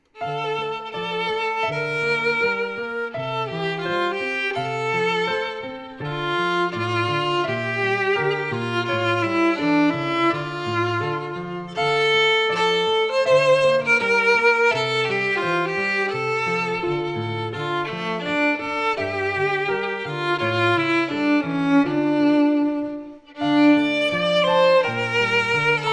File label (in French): Melancholia violon et piano; IUAV; musique instrumentale